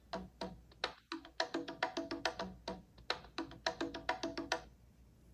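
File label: timba, iphone os sounds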